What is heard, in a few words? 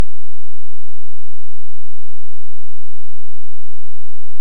Hydrophone; OneOcean